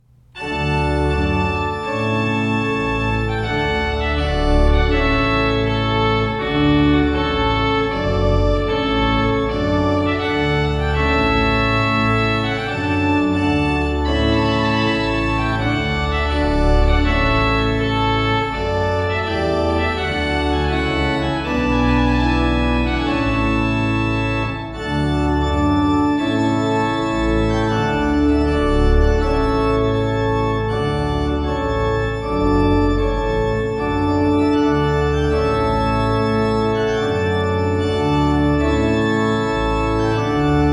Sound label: Trumpet Tune